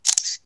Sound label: shutter; cheese